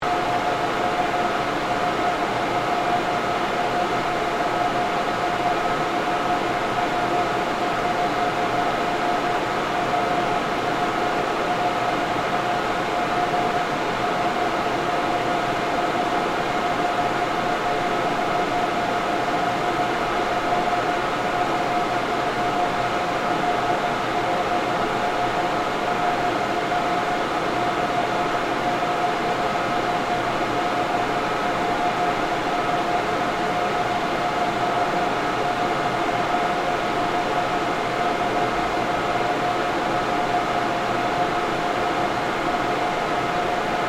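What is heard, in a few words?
Server Room Distant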